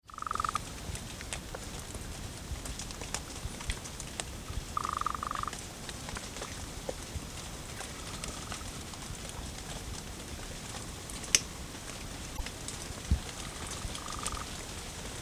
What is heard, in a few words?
GOLDEN TOAD, wilderness songs